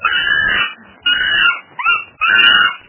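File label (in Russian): маленькая крикливая обезьяна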